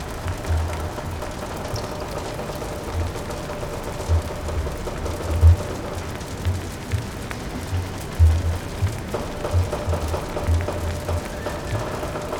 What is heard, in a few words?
sinking ship, ambience; pf2e; hellbreakers